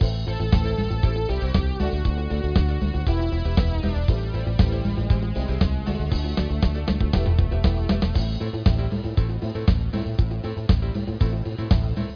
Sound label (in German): credits musik; cannonhills